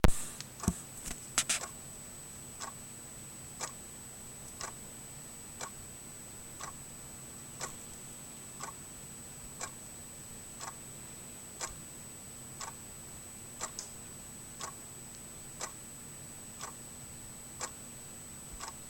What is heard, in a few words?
clockwork